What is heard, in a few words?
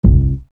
Kick